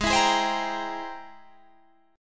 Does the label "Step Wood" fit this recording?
No